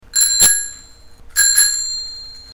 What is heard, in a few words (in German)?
Fahrradklingel Circus